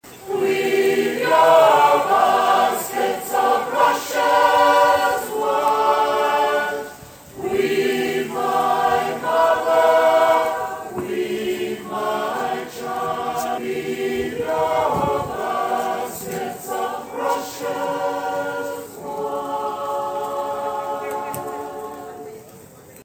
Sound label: Famine intro choir music